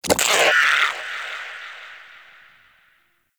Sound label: Vocal Pain, Shrimp Lantern, Creatures; Baliq